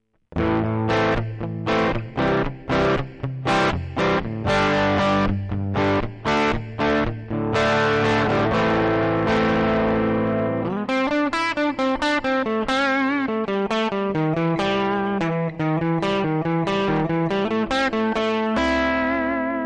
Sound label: AMZ Mini